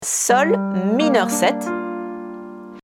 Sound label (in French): Gm7 accord fondamental